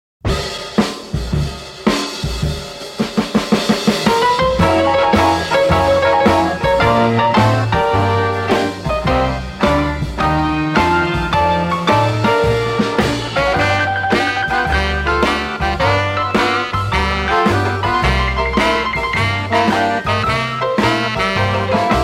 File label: left footer's one-step instrumental